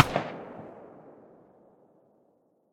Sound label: mdr silencer mono far, weapons